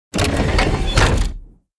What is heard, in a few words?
Heavy Tank Cannon; blue archive